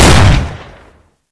Big M Fire

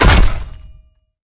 hit; hammer

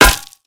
lead ball

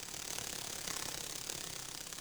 moth fly

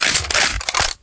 make ammo

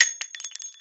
casing; silverbullet

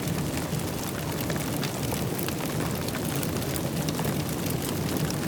on fire

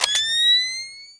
charge over

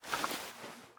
reload end; deepimpact

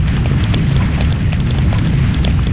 lava; doomtest

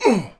land

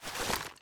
mag get, explodium gun

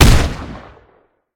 hit, explodium gun

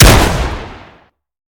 Quad Fire

